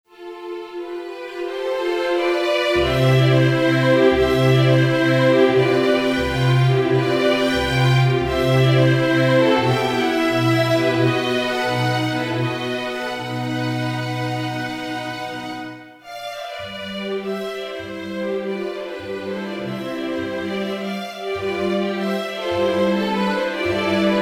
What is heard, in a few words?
string orchester